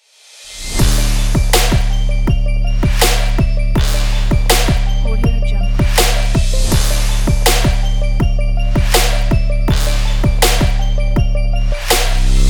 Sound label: trap powerful